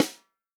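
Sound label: snare drum, Real Drum Kit; Best Service ProSamples vol.43; POP KIT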